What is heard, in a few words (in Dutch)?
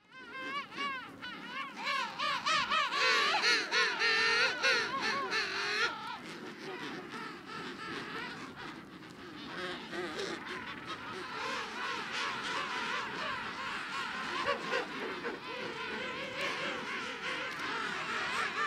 Koningspinguins; Antarctia